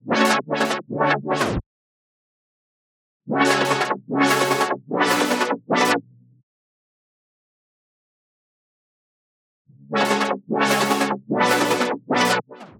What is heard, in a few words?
150 loop synth main chords lfo Dm; skreem